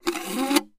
Lid is removed from a large drinking cup and straw is inserted through lid. Lid O/C Straw, Insert Open, Close